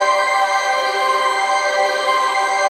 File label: pad; M8